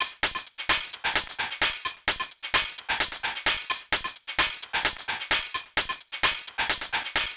Hh-Fx Loop 130; Equipped Music; Loungin House; Hh-Shake Loops 130

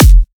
drum kick rattle; Virtual Riot; Drum Hits

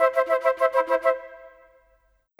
Flutes, Orchestral; Sonic Foundry (Sony Creative Software); Orchestral VOL-2; Modern 22

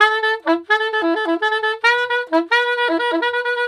SAX, Balkanish Traditions

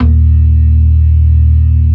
CLASSIC C3, Synth and Organ; InVision Interactive; Keith Emerson; The Most Dangerous Synth and Organ

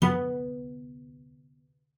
CELLO FINGER B3; 90 s Sample CDs; Xsample VOL-5

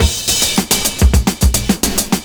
CYMB, Breakbeats

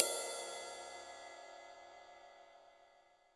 HiHat & Crash, Roland TD-20; HiHats

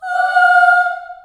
F4 child AH, choir; CHILD AHS

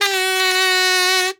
KAZOF G4, KAZOO; Xsample VOL-9

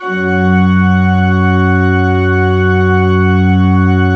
F1, Cathedral Organ, MANUAL PLENO; Propeller Island

